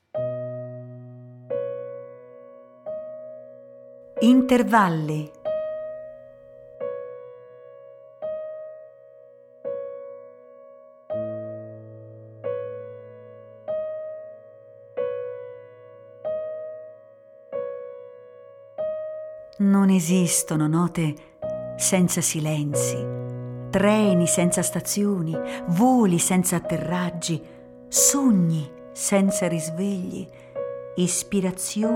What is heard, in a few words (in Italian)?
Intervalli